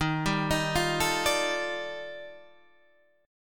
D sharp Major Sharp 11th D sharp Guitar Standard, chord; arpeggiated; 11,10,13,10,10,10; JGuitar